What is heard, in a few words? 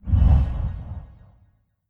free Spin Reel Spin; netent